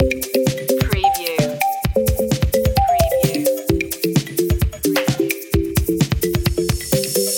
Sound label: electro cool loop; media music now